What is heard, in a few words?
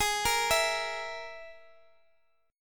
G sharp Suspended 2nd Sharp 5th G sharp Guitar Open D; x,x,x,14,13,14